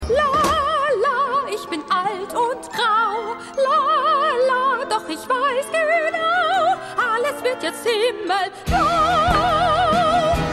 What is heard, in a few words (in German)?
Grossmutter Gesang